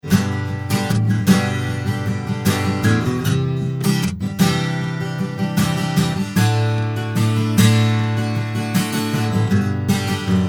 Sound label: Git Phase